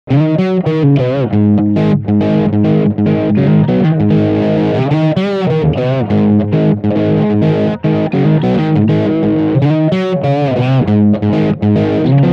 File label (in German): Crunch Stegpickup